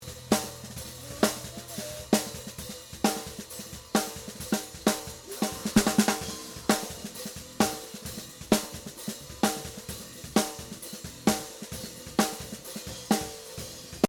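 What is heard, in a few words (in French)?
snare, le melange des deux micros Caisse-Claire dessus+ dessous mais pour l'instant sans aucun effet ni traitement; batterie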